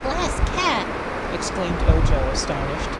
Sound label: noisy